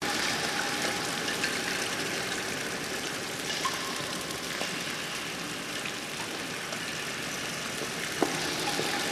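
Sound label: Water Drip Sewer Reverb Ambience Drain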